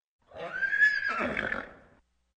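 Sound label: neighing in a stall close short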